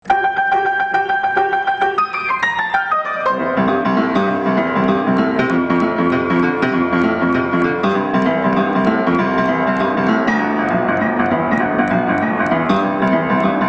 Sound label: boogie woogie; 45 sek; klingelton